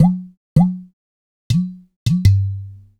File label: Udu; Sonic Foundry (Sony Creative Software); Joe Vitale Latin Percussion